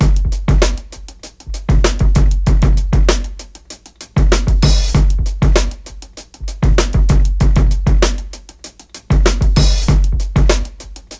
97.2 Ghost Kicker, Breaks; Lockdown Breaks

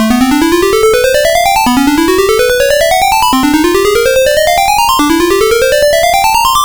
Game Boy Synth; 8-Bit; Chiptune

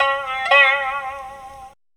World Lick

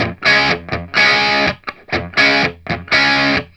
RIFF 125, Vintage Blues Guitar; USB Soundscan vol.22; AKAI

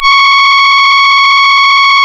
C#, Synclav FM, BRASS; Big Fish Audio

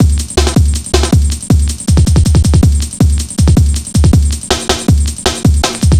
160, Drum Loops; Total Drum&Bass

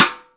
STICK, Drum 1 Dry; East Collexion

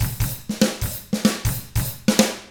95 FUNK, Drums; ILIO; Double Platinum Drums 1

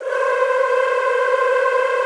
C4-L, Extended Classical Choir; Best Service

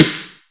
R-8 bright s, Power Drums; Power Drums U.S.A

